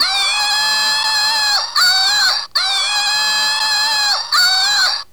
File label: launcher, toy